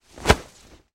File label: Nylon Swishes Quick, whooshes & swishes